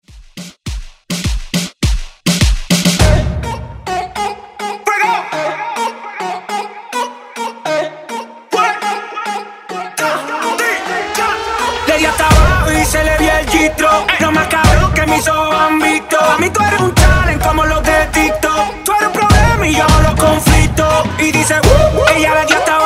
Moombahton; Redrum

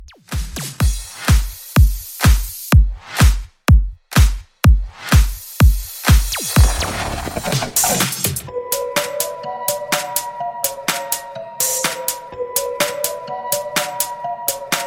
Dance Club, 125Bpm

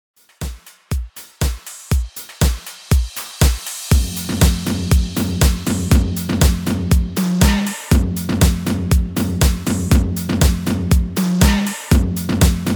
REDRUM 120 BPM

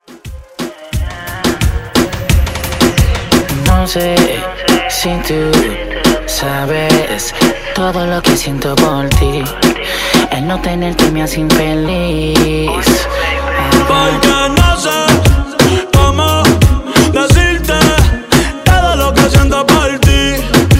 Reggaeton

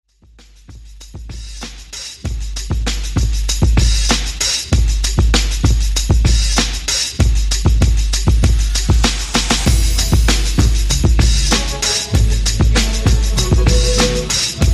50s 97 Bpm; Redrum

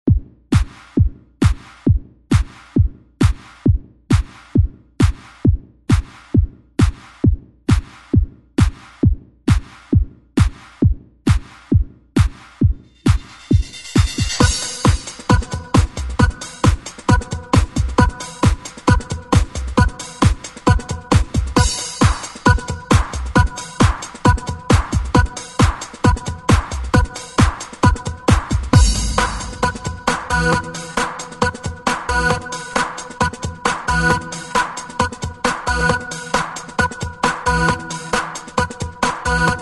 90s ReDrum; DJ Mhark

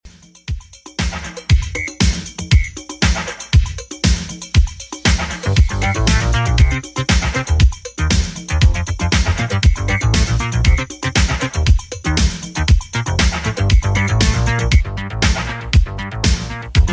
80s Redrum 118bpm

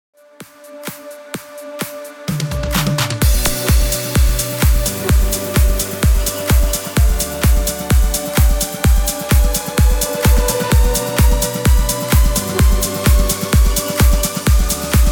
Progressive

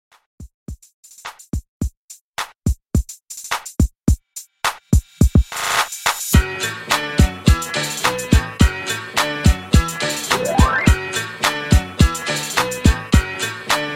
90s ReDrum, 106 Bpm

